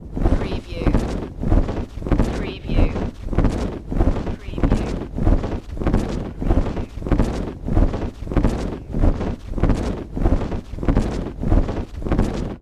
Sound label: Wings Flapping, Dragon Monster